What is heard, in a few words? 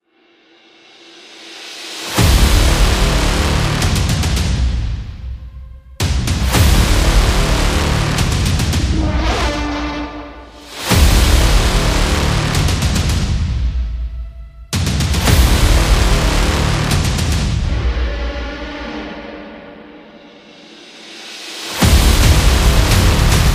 War Drum